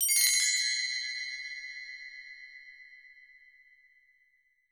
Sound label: DESC, BELLS CHIMES; Voice Crystal - Steve Reid Definitive Percussion